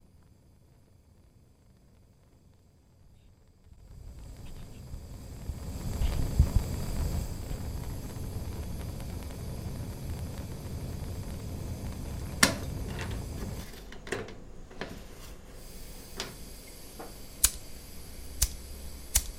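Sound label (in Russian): приготовление огня на кухне пламя и звук газа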